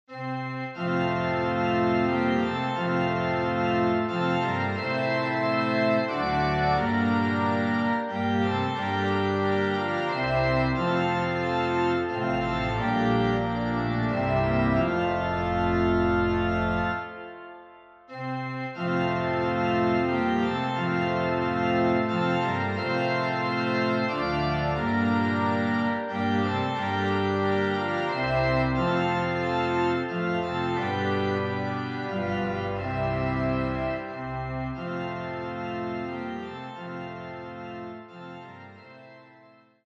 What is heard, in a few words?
Away In A Manger - Organ; 3 Verses